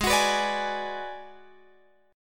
G sharp Minor 7th Flat 5th G sharp down Guitar Open F; G#m7b5 strummed